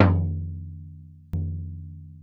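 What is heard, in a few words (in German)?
Pauke weich, sticks; SoundBytes - Early Patches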